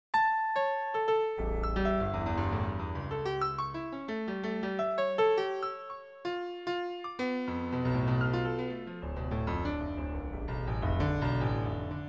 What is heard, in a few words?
microtonal sea scape piano romance